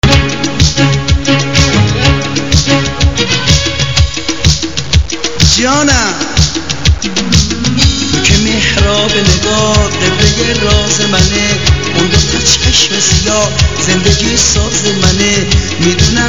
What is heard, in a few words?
Melody, RingTune